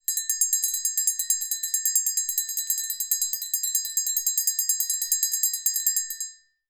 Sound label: bell small ring